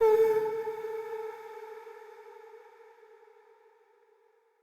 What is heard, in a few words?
Statue Voice A, Player Instrument; Statues